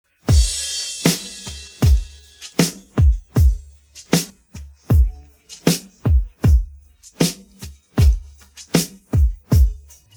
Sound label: drums pattern intro